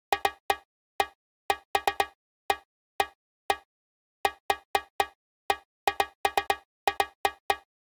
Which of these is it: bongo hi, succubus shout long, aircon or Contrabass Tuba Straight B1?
bongo hi